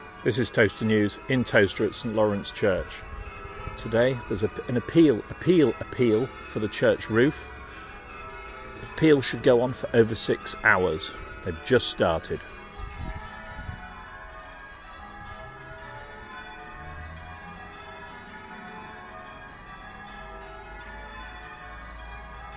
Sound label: bell ringing; peal appeal